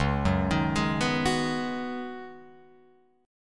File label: Db 9th Suspended 4th Db down Guitar Open F; arpeggiated; JGuitar